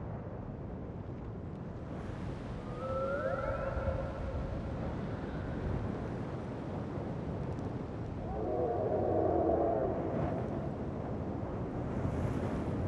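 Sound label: boneyard interior half open; Titanfall 2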